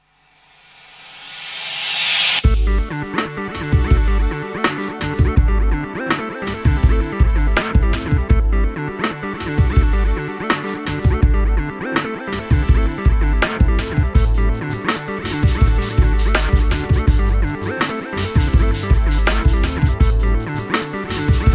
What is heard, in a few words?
High tech